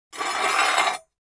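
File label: Large Tile Square Scrape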